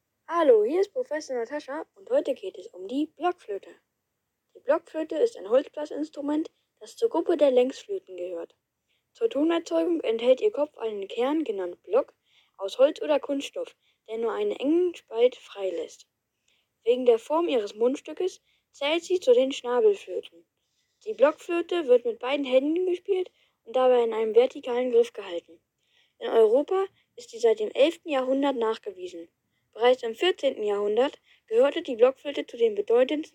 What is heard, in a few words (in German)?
blockfloete